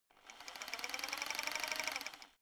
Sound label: rock drill chisel slow short; maschinen; schrauben drehen hydraulik